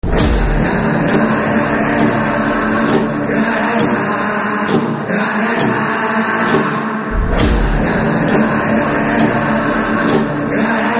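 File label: Movie Theme background music Telugu Ringtone; Krack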